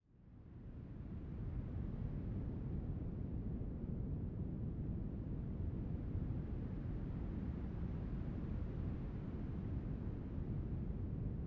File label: pond ambience